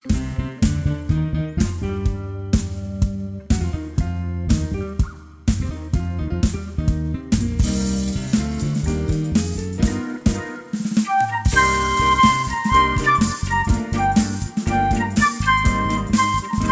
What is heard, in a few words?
retro groove flute